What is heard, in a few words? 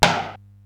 SDS9 RM, Simmons SDS-9, Rimshots